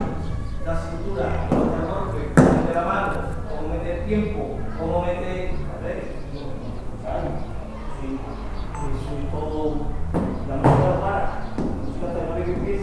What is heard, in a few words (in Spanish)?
clases flamenco